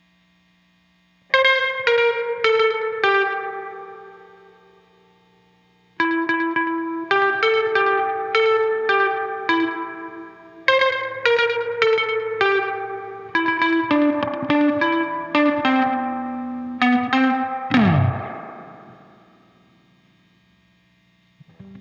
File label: guitar overdub; guitar overdub gareth